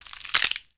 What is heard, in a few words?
picklock broken